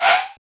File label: skrrt, Digital FX; TRUTH-HZ; Crowdsourced Sample Pack